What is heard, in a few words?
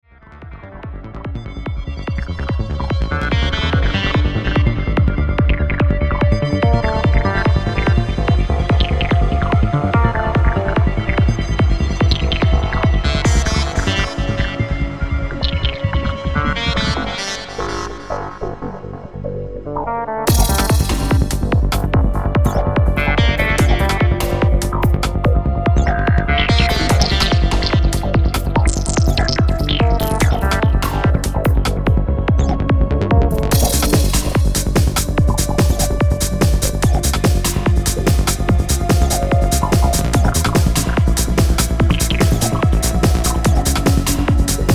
a bassline